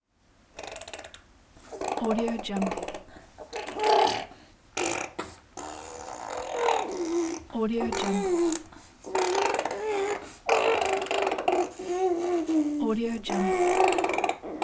baby mumbling

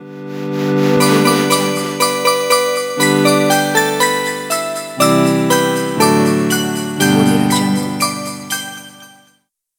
jingle bells 8 bit short logo

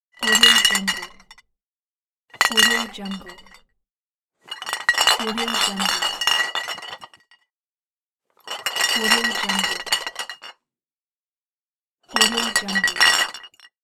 glass bottles clinking; sounddivision

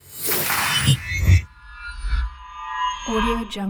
card game magic glitter missile

